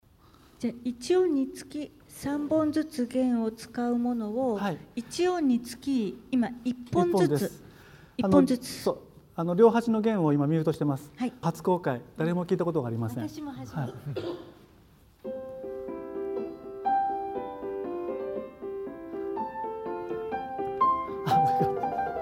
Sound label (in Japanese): 1本弦と3本弦